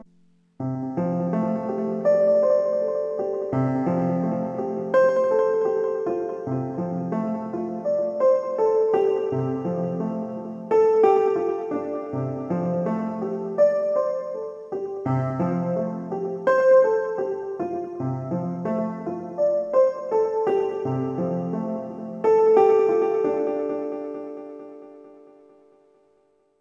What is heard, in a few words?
Korg KROSS 2 Lo FS Piano